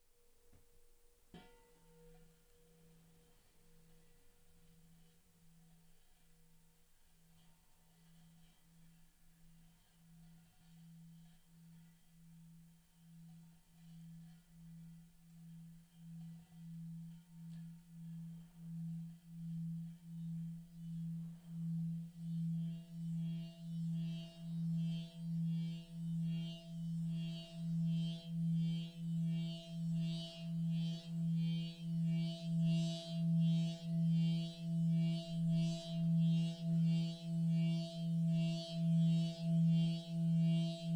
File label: Tibetan Speaking Bowl, Various Strikes And Humming; The Tibetan Speaking Bowl called this because of its singing; Miscellaneous Musical